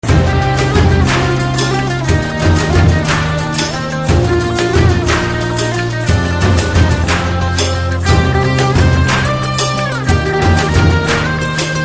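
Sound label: background music Tone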